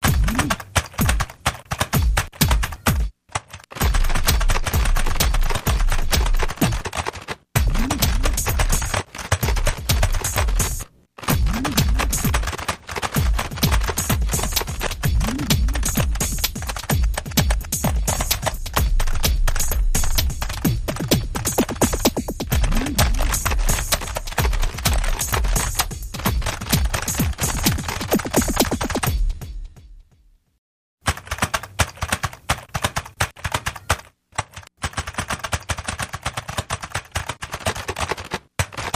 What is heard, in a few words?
Solo Keys